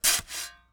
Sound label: Industry Tool Shovel Sand Movements Scrapes